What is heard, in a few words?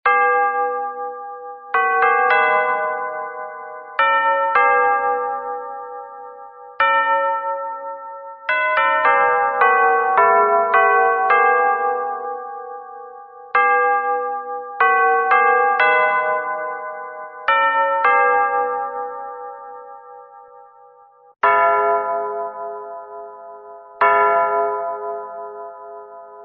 clock ave maria